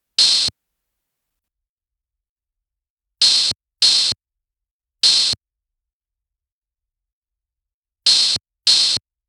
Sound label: Phonk 99bpm Open Hat; EDM Drums; Drum Kits; Stems; Ghosthack; Drum Mega Bundle Demo; Ultimate EDM Drums; Phonk Kit 03